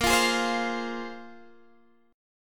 A sharp 7th Suspended 2nd A sharp, Guitar Drop D

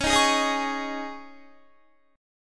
Db Suspended 2nd Guitar 4E,4A,5D,5G,5C,6F; strummed; 21,18,18,20,20,20

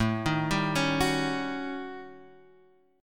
Eb 7th Sharp 9th Flat 5th, A

